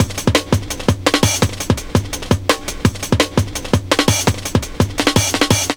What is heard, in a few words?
LOOP, Explosive Jungle